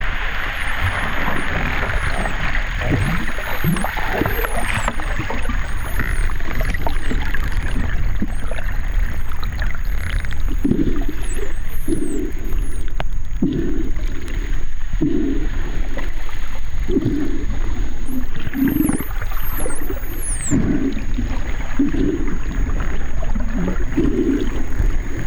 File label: Hawaiian Spinner whistles